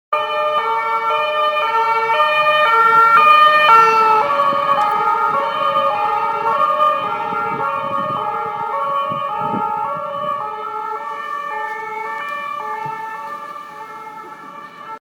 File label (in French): Sirene Paris Doppler; Duperrex